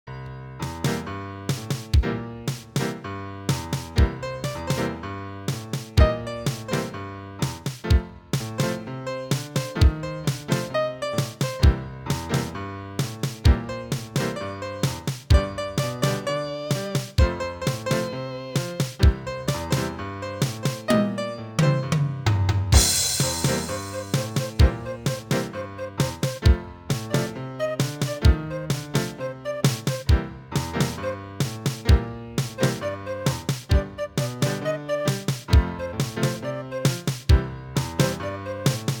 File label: MIDI blues; spellman studio